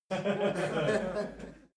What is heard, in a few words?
laugh male short